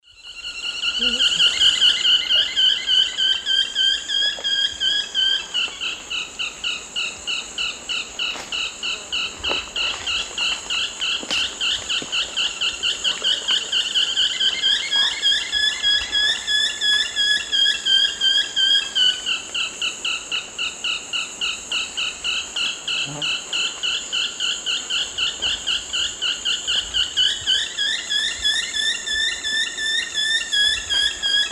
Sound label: Deconychura longicauda, calls; S. America; Eastern Lowlands